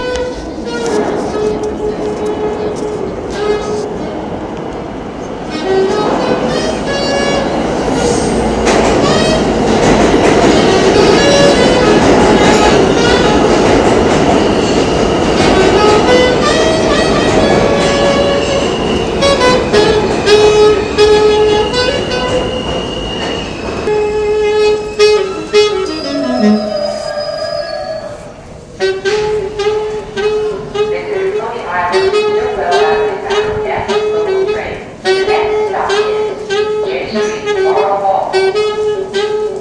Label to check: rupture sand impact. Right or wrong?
Wrong